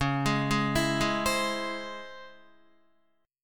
Db Minor Major 7th Db Guitar; DbmM7 arpeggiated; JGuitar

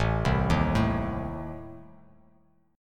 A Diminished Bass Standard; 5,3,1,2; arpeggiated; JGuitar

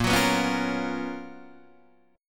Gb Major 11th

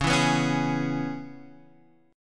C sharp Minor 7th C sharp Guitar Half Step Down; C#m7

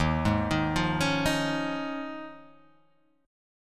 Eb 7th Suspended 2nd Suspended 4th Eb; arpeggiated; chord

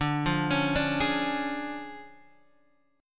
D Minor Major 7th Flat 5th D Guitar Drop D

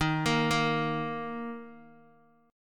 D sharp 5th D sharp, Guitar; arpeggiated; 10,13,10,x,x,x; JGuitar

